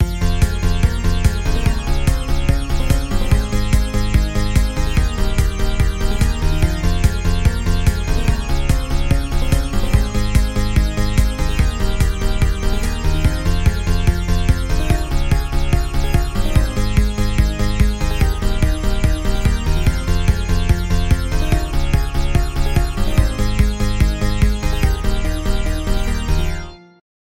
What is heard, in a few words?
kawai k3 reso bass